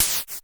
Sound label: KLONE NOIZ, Klone Dual-Percussion-Synthesiser; KLONE NOIZ NW8